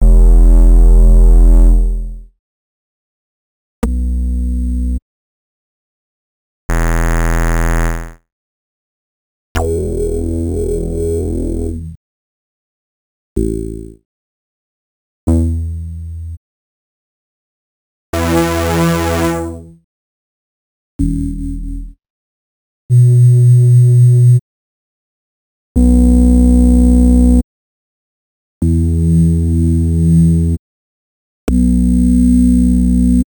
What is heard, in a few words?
Rasp Bass; X-Static Goldmine 5